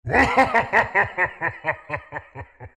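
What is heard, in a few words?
laugh, Chinese; Chaknight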